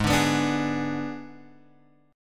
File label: Ab Diminished Ab Guitar Open G